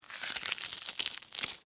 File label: Sticky Rubber, Texture, Sticky, Short, Rubber, Gloves, Movement; Cleaning Sound Effects; BlastWave